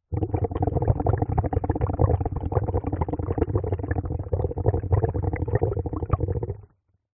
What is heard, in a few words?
bubbles underwater